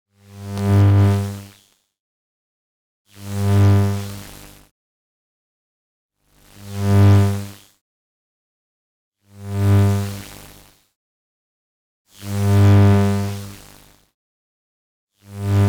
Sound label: Electric Whooshes, Electromagnetic Fields; Justsoundeffects; VOLT